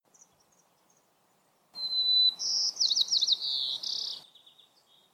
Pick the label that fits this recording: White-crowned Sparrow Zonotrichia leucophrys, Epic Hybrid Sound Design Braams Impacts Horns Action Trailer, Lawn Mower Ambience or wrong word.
White-crowned Sparrow Zonotrichia leucophrys